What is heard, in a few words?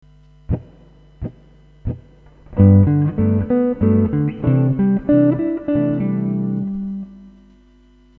Lick